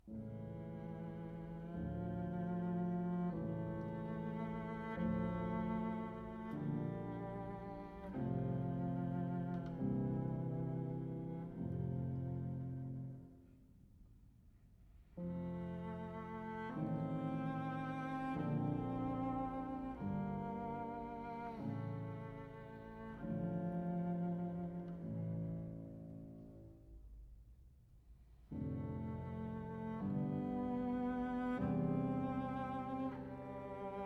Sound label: Sonata for Cello and Piano No.5 in D, Adagio con